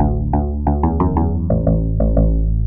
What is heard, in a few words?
bass